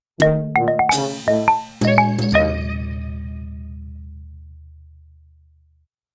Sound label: Victory!; Frostbite Caves; Plants vs. Zombies 2